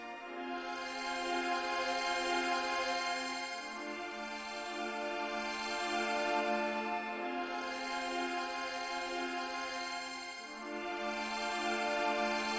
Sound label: good flux arps; freeflight